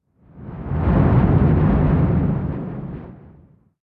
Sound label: damage hits explosions